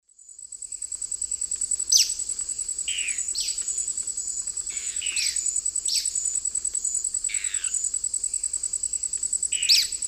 Thamnomanes ardesiacus, group calls, S. America Eastern Lowlands